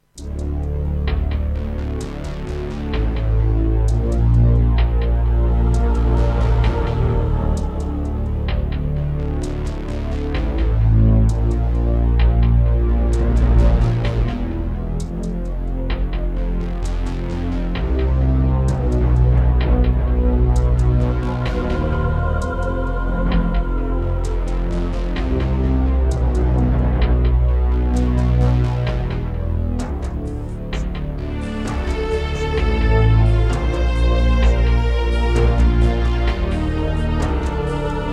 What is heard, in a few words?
Roland SC-8820 55MAP; Descent II - Briefing